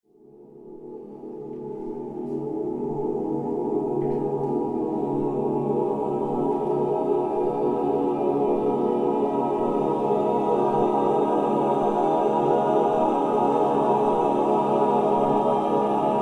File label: improvisation with choir part